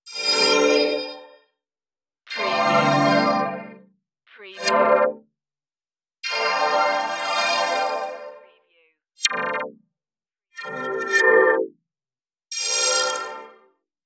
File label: message notification alerts